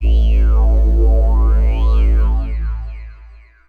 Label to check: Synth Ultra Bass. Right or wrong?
Right